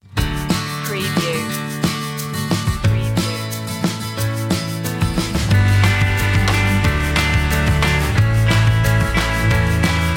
indie feelgood

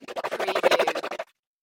Whoosh Sputter Chopper; Sound Effects Bible

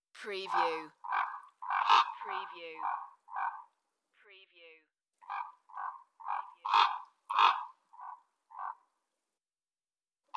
Bird Call; beanstalk audio

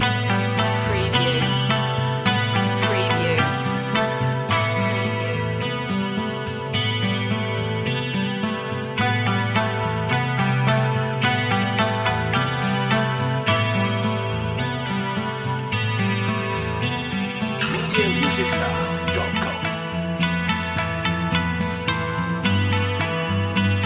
japanese garden loop

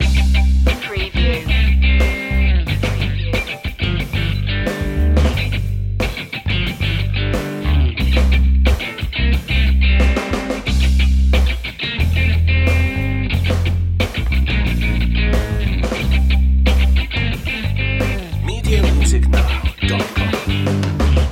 happy birthday funk loop

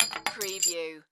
Debris Glass Bounce

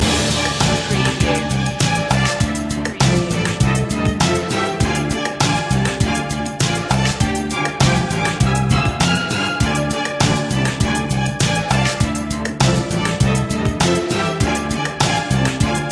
spooky loop; media music now

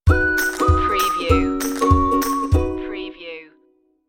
happy folk stinger

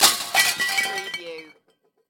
CARTOON CRASH PANS GLASS BOTTLES

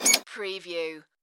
Tui Peep

